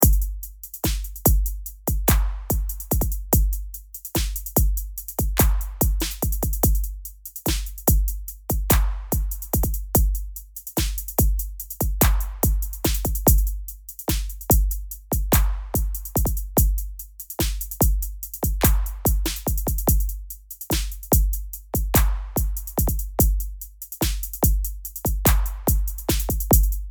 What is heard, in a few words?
beat 145BPM; Dat beat